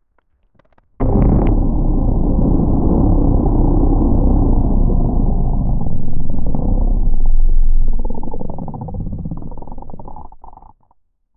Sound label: submarine diving deep and creaking under pressure